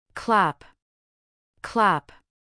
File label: clap